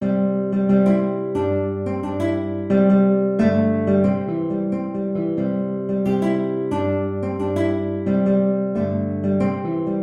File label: GTR; Comin Thru The Rye; guitar